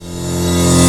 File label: reverse; Techno Trance Essentials; FX & ATMO